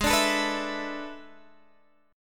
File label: A Major 7th Suspended 2nd Suspended 4th